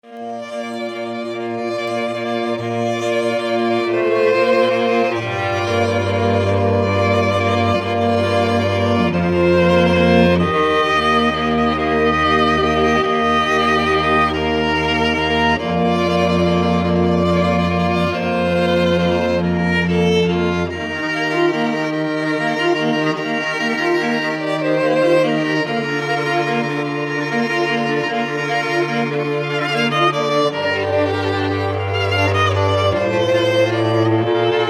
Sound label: wedding strings